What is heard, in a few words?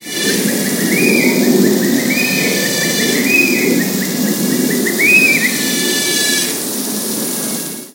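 Spizaetus tyrannus, Jardim Botanico SP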